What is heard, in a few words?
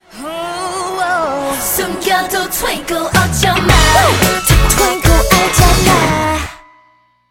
Twinkle Ringtone SMS